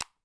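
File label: click button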